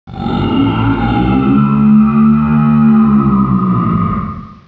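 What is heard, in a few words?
cavern ambience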